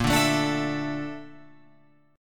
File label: Bb Major 7th Flat 5th, Guitar, Open F; strummed; 10,9,9,9,12,11; BbM7b5